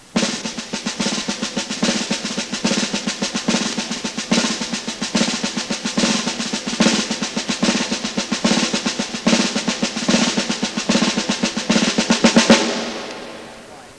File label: drums